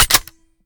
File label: reload empty fast waffle chamber; reloads; gmod; iw8